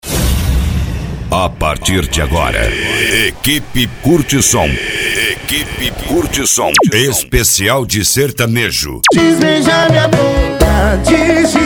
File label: Sertanejo; Equipe CurtSom; Cacequi RS